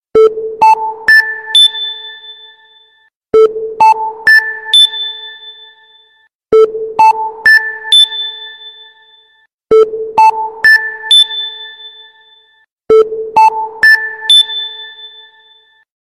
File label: ascending, alarms